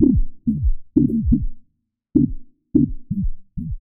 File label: 126 D MUSIC; funky; Loopmasters; Funky Underground Vol 2